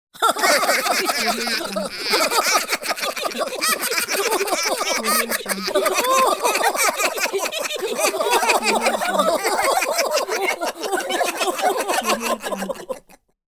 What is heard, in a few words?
small group cartoon characters laughing